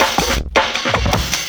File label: LOOP SD, Drum & Bass, 160 BPM